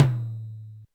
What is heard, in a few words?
Yamaha RM 50 Toms